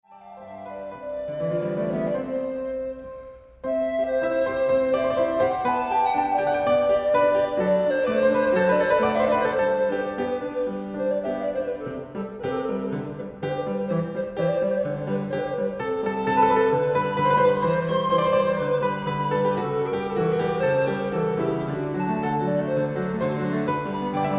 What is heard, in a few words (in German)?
Sonate C-Dur